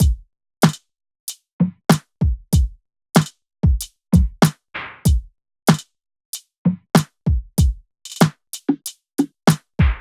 Bush Drums 95bpm; Loop, WAV

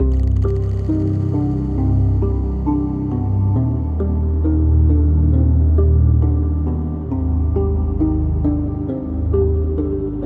Melody Cm 135

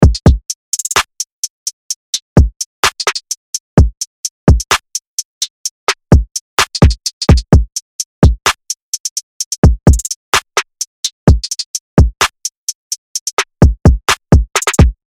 Drum Loop

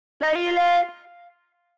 distortion

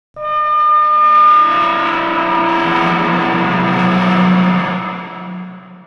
Cinematic FX Textures; Loop, WAV

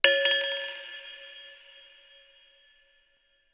Rattle FX; Loop, WAV

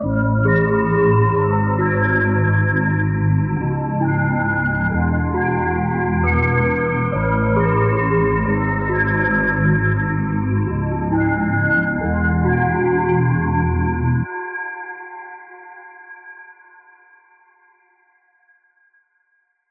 Melody Am 135

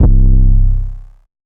808 Oneshot Distorted C; Drums808, Distorted, Hip Hop, Trap

